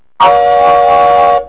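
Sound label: steam locomotive, trains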